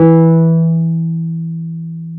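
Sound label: piano Wurly; Keys of the 60s and 70s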